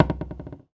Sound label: Foley